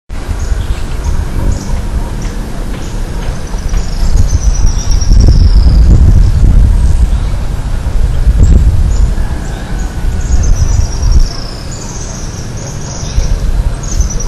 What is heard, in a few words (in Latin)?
Leptasthenura setaria; PP Araucaria; Jorge La Grotteria